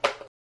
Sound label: staple gun; ion sound